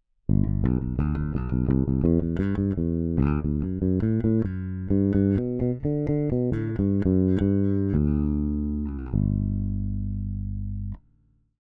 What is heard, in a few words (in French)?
basse ficher brut; rupert neve portico